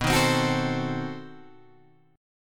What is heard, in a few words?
B 7th Flat 9th B Guitar Open G